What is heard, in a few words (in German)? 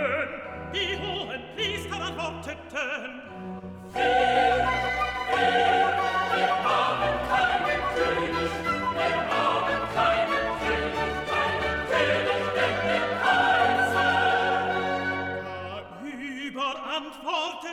SOPRAN, Stimmen mit Klavier; Johannes Passion